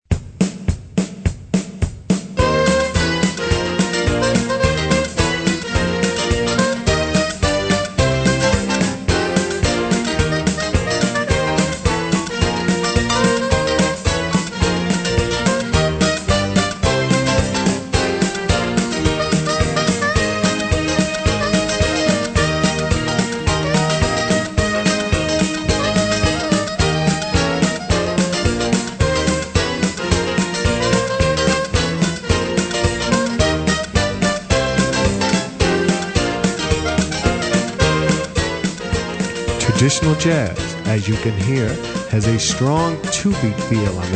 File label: Traditional Jazz